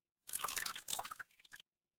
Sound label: water pour